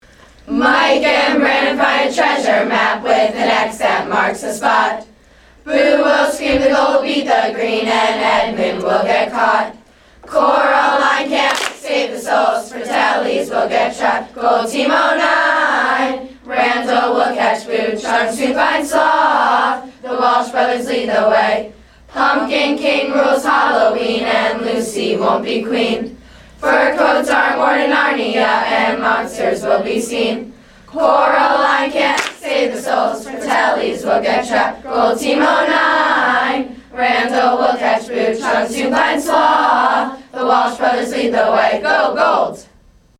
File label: Cheer, Camper Fun campsongs; Goonies